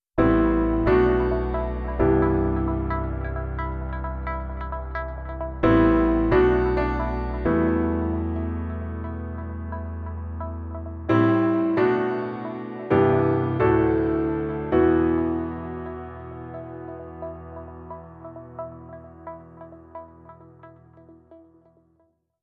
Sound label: Move No Drums